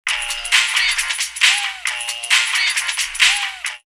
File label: Hippy Beat 134, drumloops, Rave; X-Static Goldmine; 90 s Sample CDs